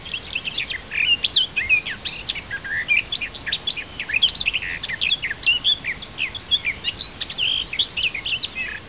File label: garden warbler, birds